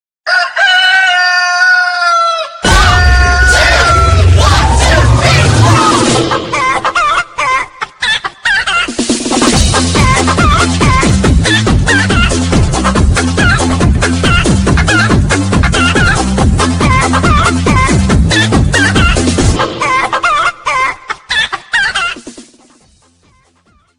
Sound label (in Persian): تکنو خروس